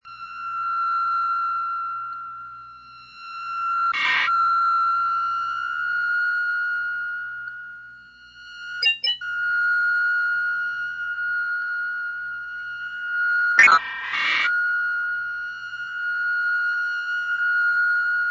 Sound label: Electronic